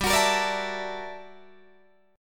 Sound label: G Minor Major 13th, G, Guitar, DADGAD